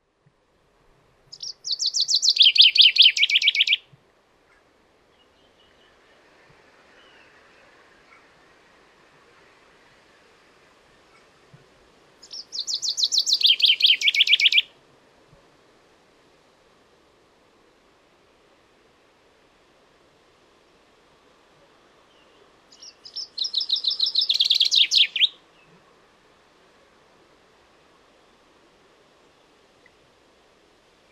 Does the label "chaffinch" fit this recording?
Yes